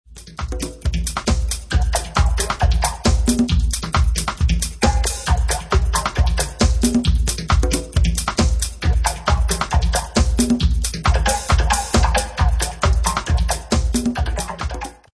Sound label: Techno; drumlet